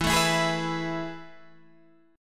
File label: A Major 7th Suspended 2nd E